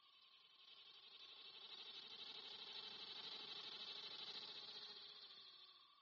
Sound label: cicada; ambient soundscapes; dota